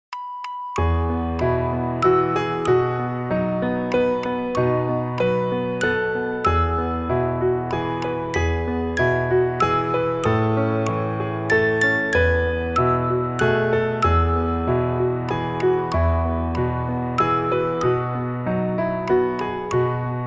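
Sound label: happy birthday to you минус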